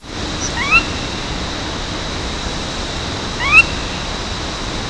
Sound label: sora rail